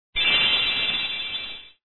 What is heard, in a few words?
sparkle; trollz com